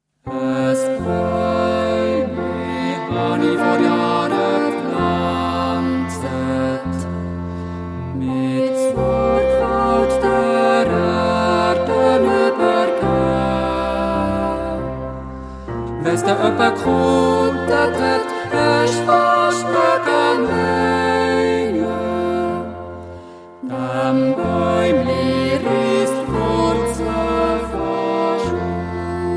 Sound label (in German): Jodelduett, Violine, Bassgitarre, Klavier